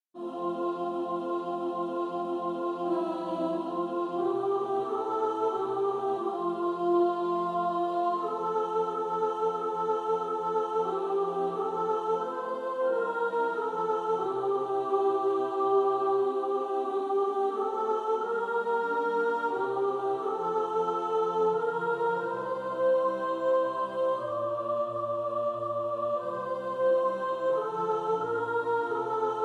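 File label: soprano, practice music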